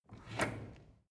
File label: drawer cutlery open draw short